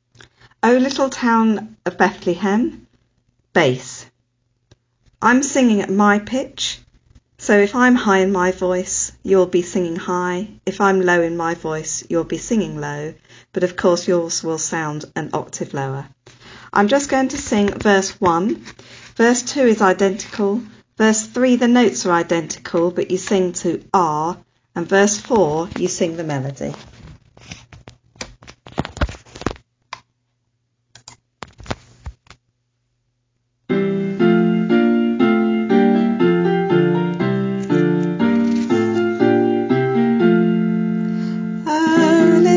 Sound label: O Little Town of Bethlehem Bass